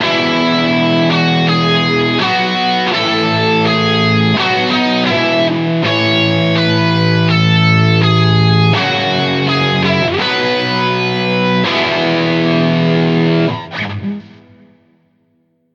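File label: Win, game music; Project Reality BF2 1.6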